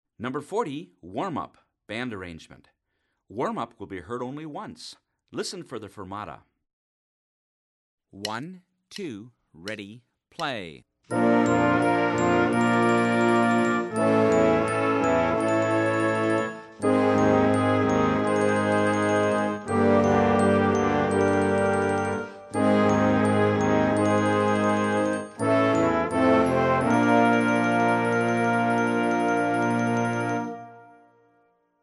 warm up

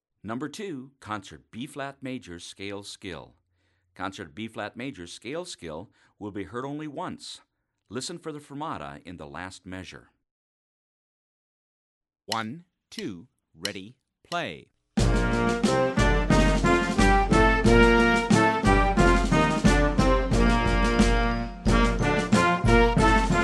scale concert bb

